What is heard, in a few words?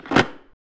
SNAP, Anime Sound FX; AKAI Anime Sound FX